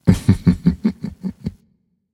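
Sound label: "heh heh", nonverbal; smite; khepri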